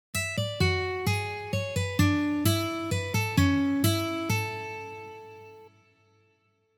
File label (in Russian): Nokia na gitare